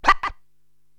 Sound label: DJ Vocal Rub; Korg TR-Rack